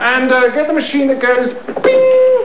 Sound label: ping; monty pythons